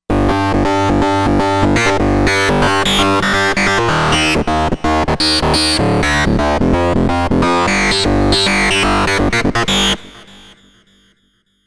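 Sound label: Bass Hard sequence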